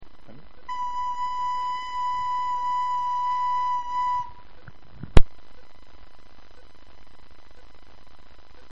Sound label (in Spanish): si flauta